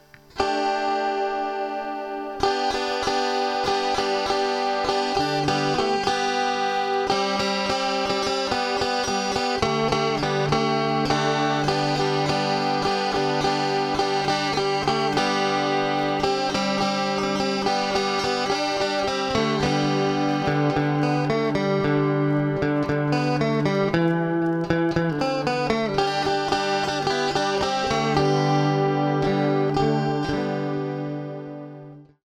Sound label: jingle banjo